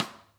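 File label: side stick, Interactive Drum Kits; Sonic Reality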